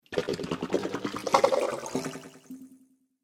Water Space Bubbles, sci fi; Water Sound Effect; Splish, Splash, Splosh!

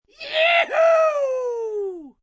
Cheer Yee Hoo, Cheering, male; Crowd Sound Effects

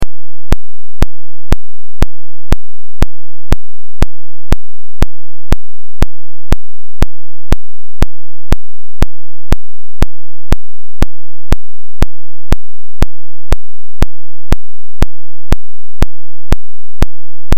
Test Tone Square

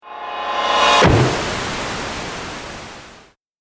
Trailer Hit White Noise; Trailer Sound Effects

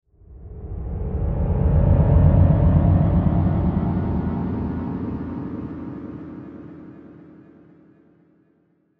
Swell Ascend Musical Delay; Swell Sound Effects; Blastwave

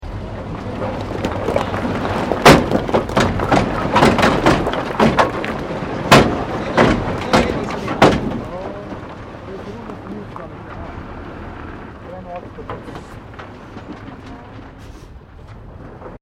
DUMP TRUCK EMPTYING ROCKS; Construction Vehicles

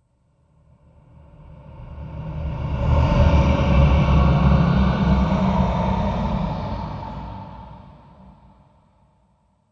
Ghost Phantom Horror

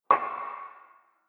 Treated Metal Hit; Metal Sound Effects; Impact

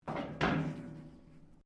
metal rusty move; Materials

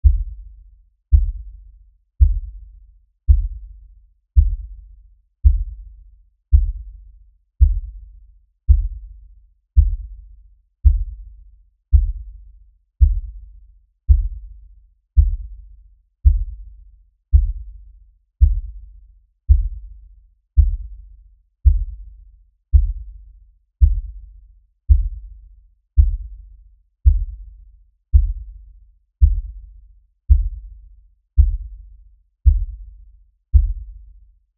Lfe Beat Low Bass Pounding Rhythm; Miscellaneous Musical; Blastwave

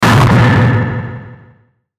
static noise; Static Noise Fuzz To Impact Reverb; Production Element Imaging Element Accent Transition; Blastwave; Static Sound Effects

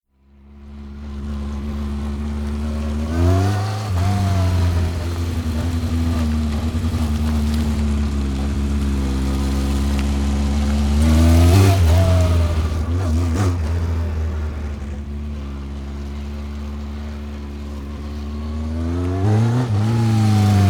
Motorbike Honda 1100 Cruising Around Car Park; Various passes then up stop and off; Motorcycle

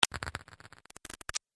Static Electricity Charge; Miscellaneous Electronic

